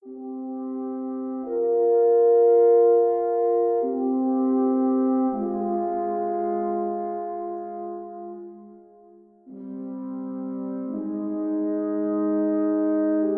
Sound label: aquatic ambiance orchestration, chiptunes